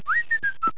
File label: Whistle; Homer